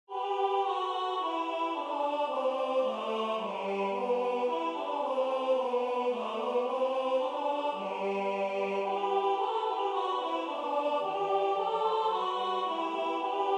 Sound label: Angels from the realms of glory ALT